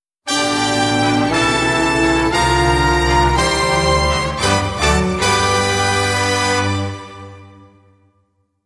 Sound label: Solo Orchestra